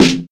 Short Snare One Shot C Key, acoustic snare sound tuned to the C note